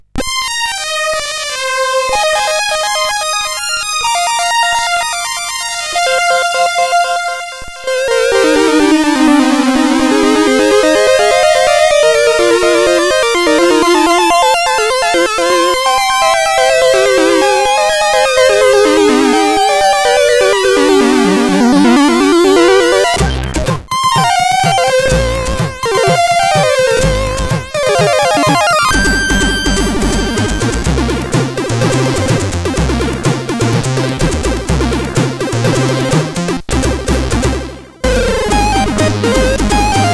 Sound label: Echorama, CSG8580R5